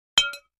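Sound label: Large Tile Square Pieces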